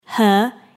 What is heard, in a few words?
heh